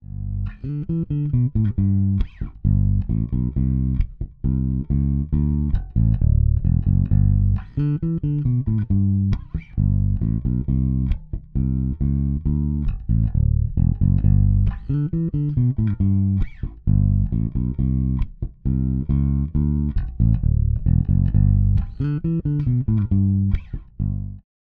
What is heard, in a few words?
Sadowksy NYC Jazz Both Pickups aktiv